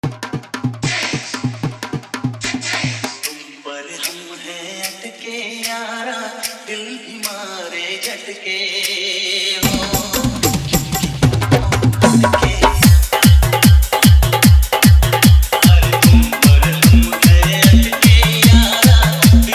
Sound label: Hard Dhollki Dance Mix